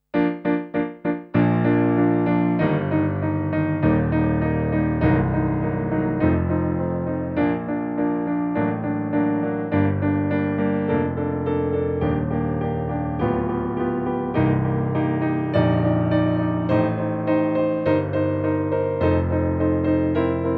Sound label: Piano only; Ich grolle night